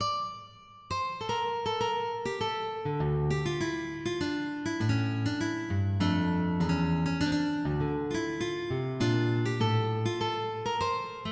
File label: Gigue